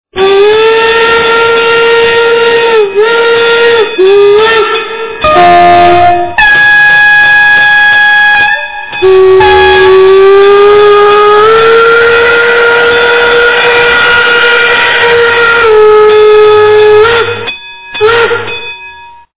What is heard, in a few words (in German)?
Pfeifen